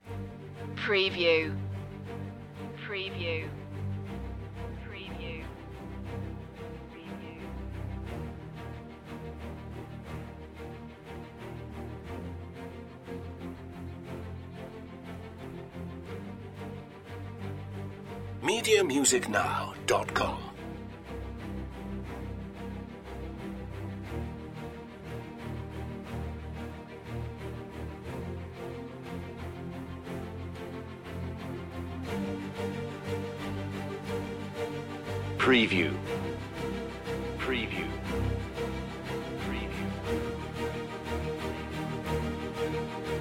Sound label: the polaris enigma strings only